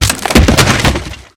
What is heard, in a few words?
wood box break; physics; source engine; left 4 dead 2